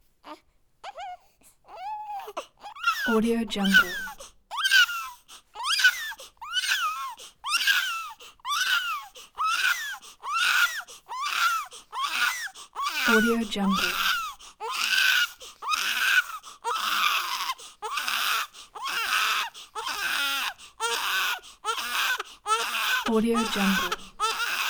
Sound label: baby squeal; prosoundfx